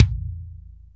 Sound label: Kick, Akai MPC-500; Toolkits; Kick TKit